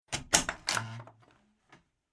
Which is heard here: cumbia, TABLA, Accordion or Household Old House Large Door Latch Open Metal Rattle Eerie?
Household Old House Large Door Latch Open Metal Rattle Eerie